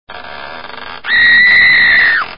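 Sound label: Door Scream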